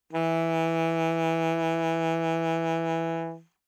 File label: Alto Sax vib ff E3, Eb Alto Saxophone; Woodwinds; MIS Pitches - 2014